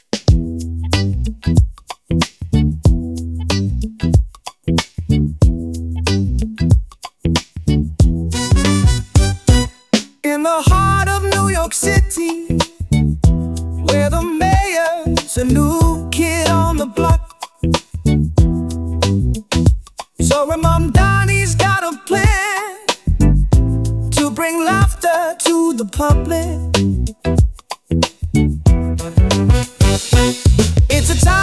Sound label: 70s Funk